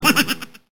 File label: laugh